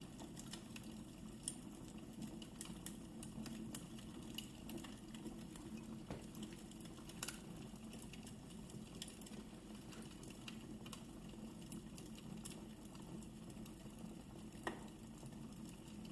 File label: ambient log fire soundscape